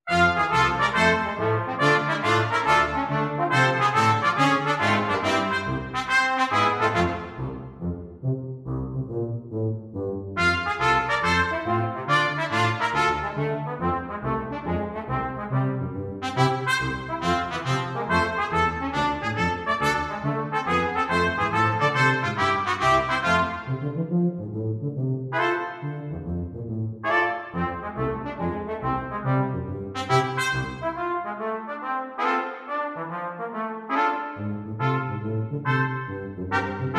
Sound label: ragtime